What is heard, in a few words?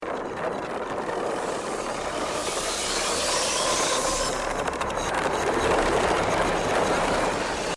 rain on roof